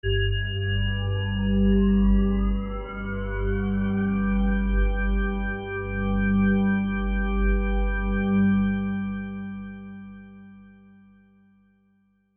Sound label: bell pad